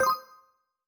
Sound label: confirm, tones; inteface sfx pack 1